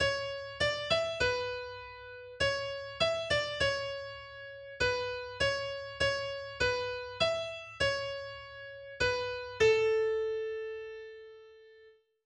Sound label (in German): Vorübung, der Ton c# bzw. db auf der B-Saite, Gitarre; c#/db5; Gitarrenkurs zum Erlernen des Notenspiels auf den untersten vier Bünden